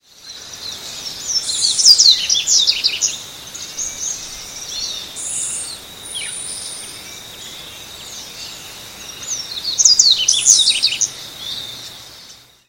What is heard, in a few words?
Sporophila caerulescens; PN Itatiaia; Silveira